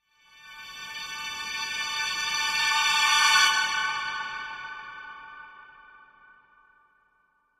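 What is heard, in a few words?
Violins Sad Crescendo; strings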